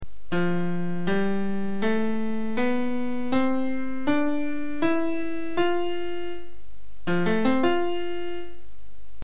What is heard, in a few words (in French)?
lydien